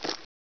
bandit run step right; gearbox

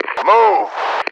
move

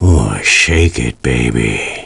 Cheer; svencoop; voice commands

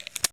357 shells in, weapons; svencoop

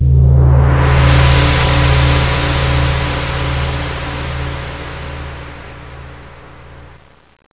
gong; valve; shaolin

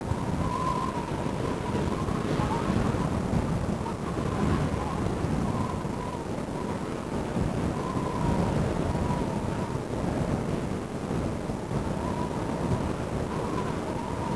buzzer wind; svencoop; russian sounds

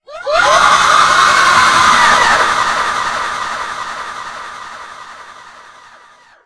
attack; svencoop; sc mark

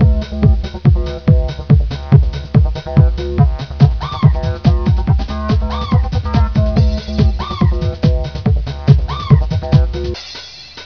disco

